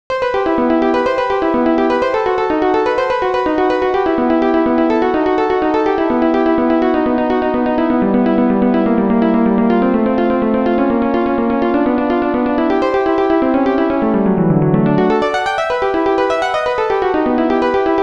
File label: prelude; clique